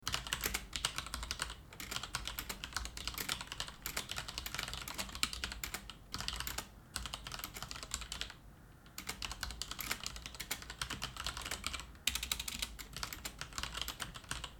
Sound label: Typing; Type-Byte; type-sounds